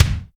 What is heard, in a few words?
treble rich kick drum single hit F sharp key